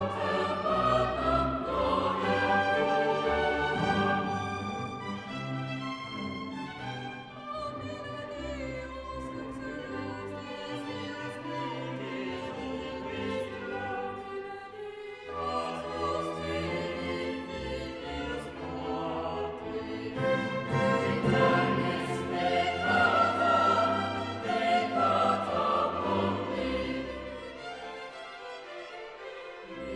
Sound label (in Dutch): Koor Jongens